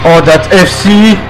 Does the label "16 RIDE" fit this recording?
No